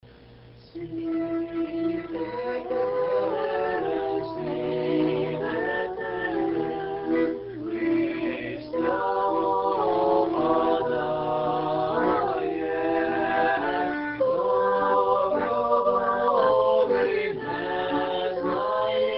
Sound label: Ukrainian song; Theophany 2008